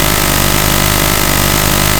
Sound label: whir tap